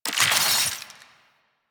Infuse Ice UI; Warframe; Warframes; Alchemist

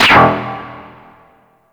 Disc Shockwave; Warframe; Corpus Disc Osprey

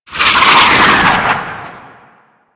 Plunge Ability Angry Proj; Enemies; Abilities; Warframe; Duviri; Duviri Dax; Dax Knight

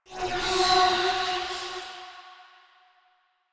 Generic Foley; Warframe; Choir

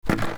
Wood Stool Drag Scrape

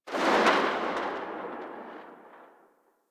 Pistol Tail A; Sybaris

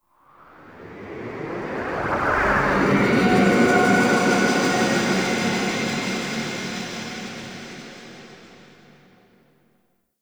Exit Elevator; Warframe; Nokko Colony; Vendors

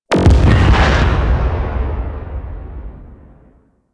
Enemy Ship Destroyed Explosion; Warframe; Vehicles; RailJack; Corpus

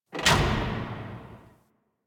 Worker Lever Push; ambience; props; one offs; Warframe; Venus Open World; Venus Solaris United

